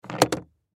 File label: Case Handle Drop Impact Luggage; Drops; Foley; Objects; Blastwave